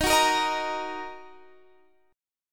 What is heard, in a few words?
D sharp Minor, Guitar, Standard; strummed; JGuitar